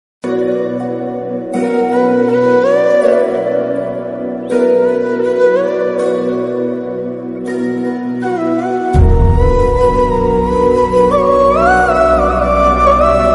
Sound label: flute bgm ringtone; khuda aur mohabbat 3